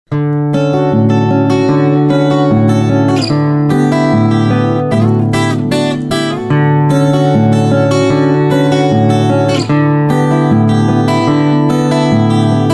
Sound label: sweet time, Gitarre; blues; ragtime